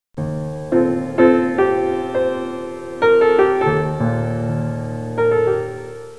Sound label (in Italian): suonerie classica; musica classical